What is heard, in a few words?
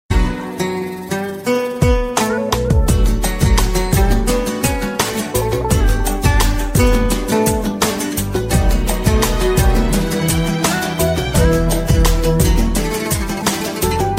Arabic Guitar Tune